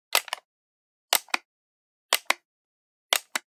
Generic Button Metal Medium

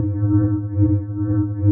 shield fast unsteady; Apotheorasis

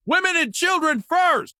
town human far; Blind Drive

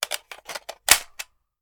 Machine Gun Foley; Sound Ideas; Guns

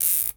Cable Tie; Tools; 1001 Sound Effects

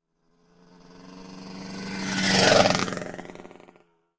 motorbike; Blind Drive

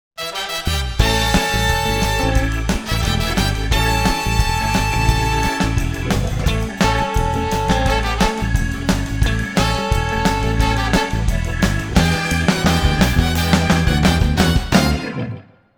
Jingles; iLife Sound Effects; West Precinct Medium

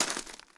gravel; firefight

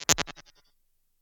282 Delay TAPE, Impulse Responses; Reverbs; PastToFutureReverbs; SST; CLA; IRs

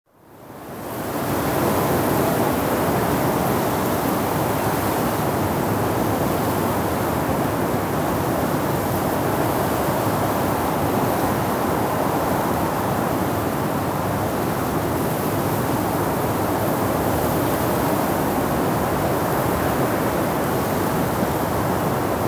wind dune; best service Blue Box

